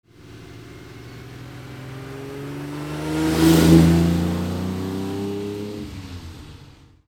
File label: ferrari 330 gtc